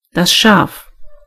sheep; gcompris

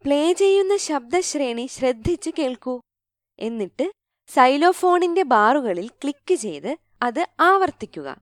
melody; gcompris-data

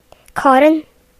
air horn; gcompris-data

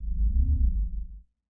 Window Move; Oxygen; plasma

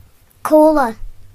shutter; gcompris-data

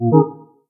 wrong

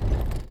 car

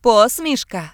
laugh; gcompris-data

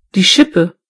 shovel; gcompris

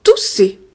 cough; gcompris-data

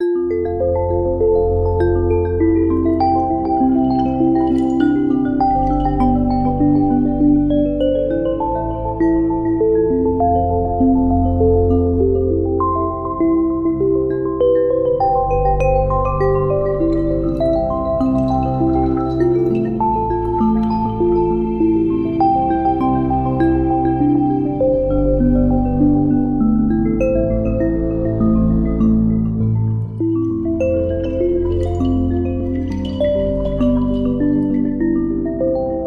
happy lullaby, background music; gcompris

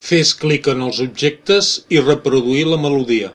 melody; gcompris

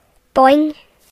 coin; gcompris-data

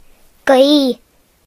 wind; gcompris-data

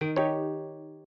Internal Error; oxygen sounds; plasma

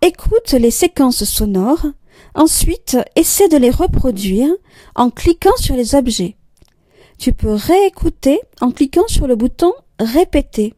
melody; gcompris-data

